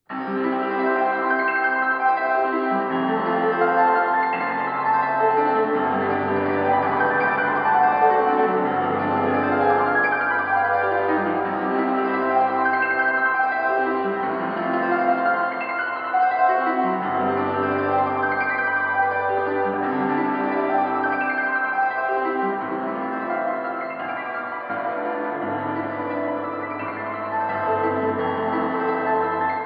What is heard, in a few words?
Chopin With Reverb